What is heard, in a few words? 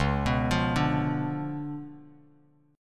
C sharp 7th Flat 9th Bass Standard, chord; C#7b9; arpeggiated; JGuitar